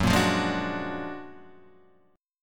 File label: F sharp Augmented Major 9th F sharp Guitar Half Step Down